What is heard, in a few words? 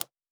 Talent Tab Close